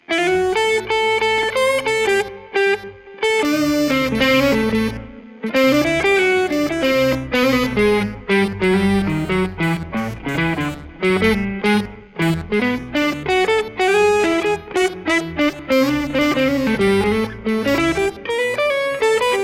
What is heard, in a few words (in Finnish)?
kitarasyna; guitarsynth